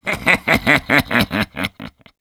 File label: laugh evil, ru; Зловещий смех 1; Sniper; Насмешки снайпера